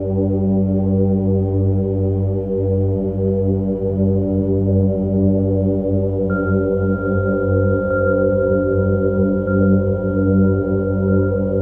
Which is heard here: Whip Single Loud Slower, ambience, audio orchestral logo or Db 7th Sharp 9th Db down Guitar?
ambience